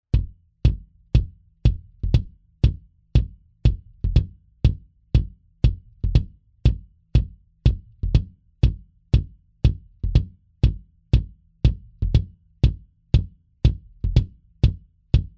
V-Kick Schlagfell Modern Classic; sE Electronics

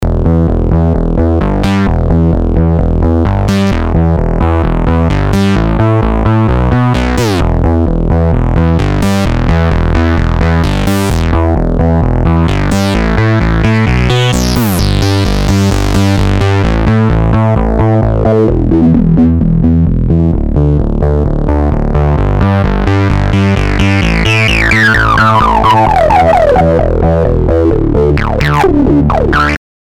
russian filter basslauf LP dry

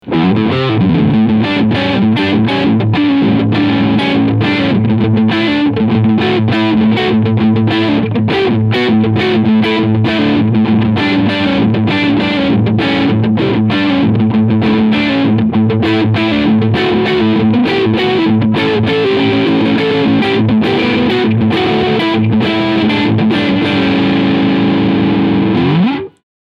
Peavey HP2 Crunchsound beide Pickups

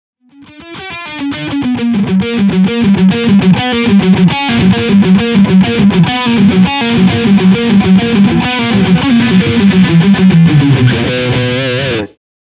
Tone 12 Uhr EQ deep

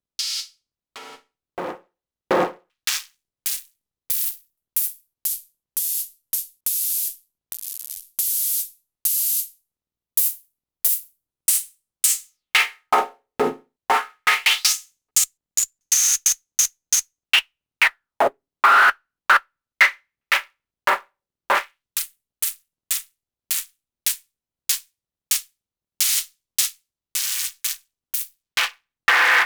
Soma Pulsar 23 Einzelsounds HHT

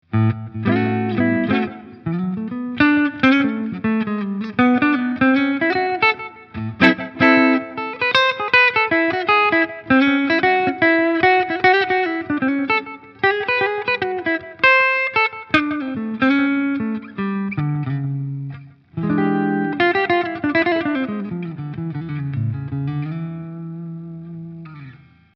Hals clean jazzy bluesy

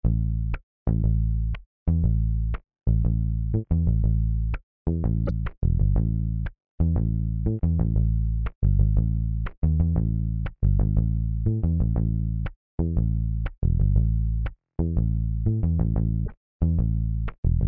Bass Original

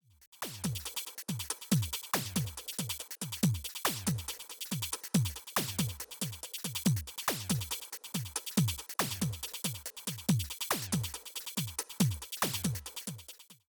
Beat ohne Master FX; Wave Alchemy Triaz

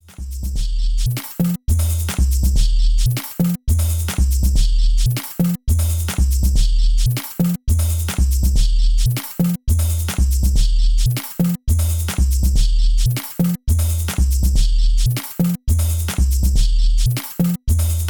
Slice normal Quant 16tel sync ganze

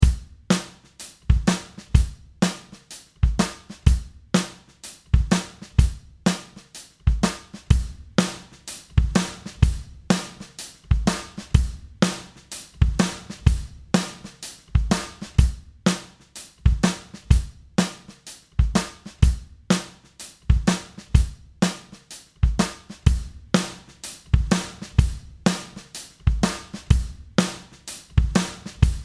VCA Kompressor

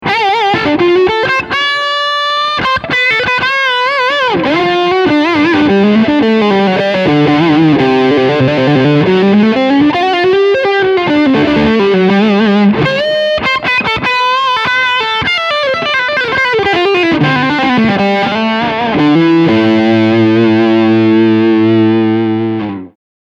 Leadsound Steg PU

